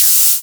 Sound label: Cymbals HH Open; Vengeance Samples